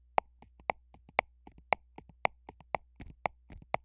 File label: footboard comparison pro d2 piezo